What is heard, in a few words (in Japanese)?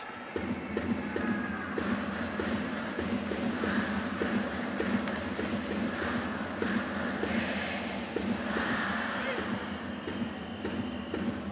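yakyuu ouen, baseball cheering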